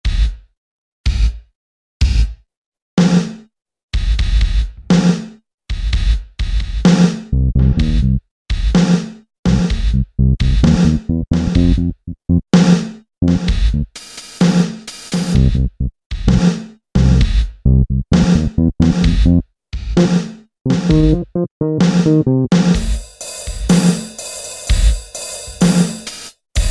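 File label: Drum + Bass, Korg MSC-9S Organ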